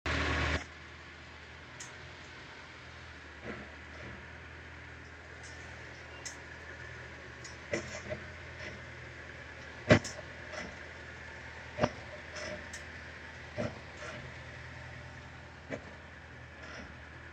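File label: weird brake noise weird front brake noise; XK8 XKR X100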